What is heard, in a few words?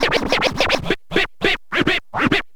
scratch short, Vinyl scratches